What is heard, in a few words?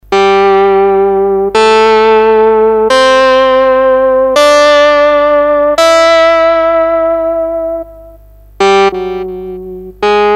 Blues Mood